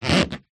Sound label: foot move squeak in flip flop; foley